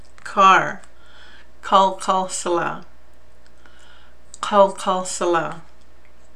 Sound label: Car